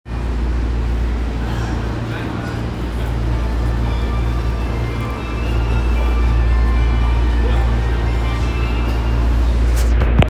有楽町線護国寺東, train sounds

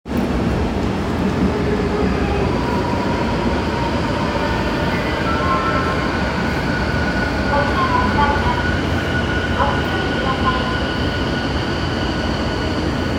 小田急線千歳船橋東, train sounds

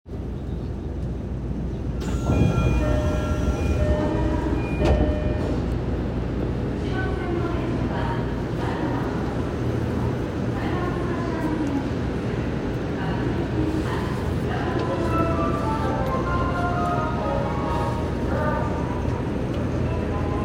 南北線東大前北, train sounds

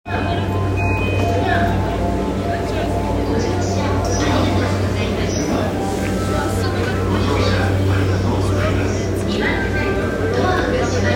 JR山手線巣鴨CCW

